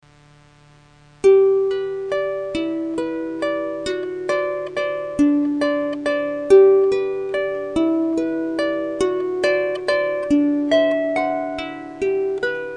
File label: Little Waltz